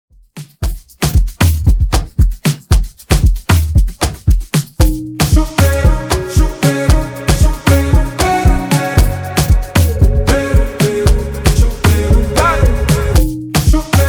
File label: afrobeat